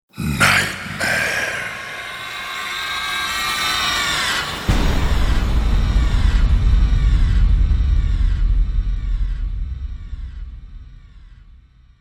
Nightmare Stinger, Eerie nightmare screams followed by The word ‘Nightmare’ spoken in deep sini; Eerie nightmare screams followed by The word ‘Nightmare’ spoken in deep sinister voice with impact hit.; Stingers